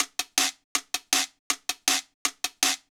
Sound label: Quiro, Latin Percussion; Sonic Foundry (Sony Creative Software)